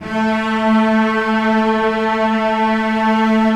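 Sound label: celli sustain a3; Sonatina Symphonic Orchestra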